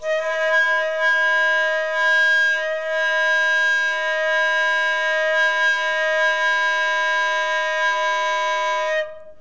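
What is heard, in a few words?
D sharp 5 with D6 with G6 with A sharp 6, multiphonics, flute; modern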